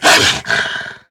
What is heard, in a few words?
attack hit, dog